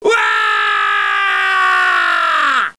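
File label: die, alien controller; giant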